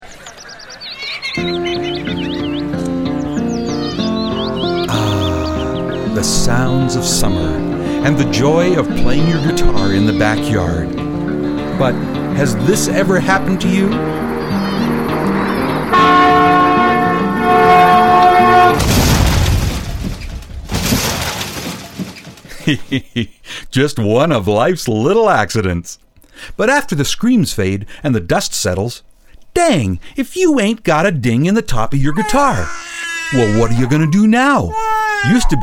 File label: ding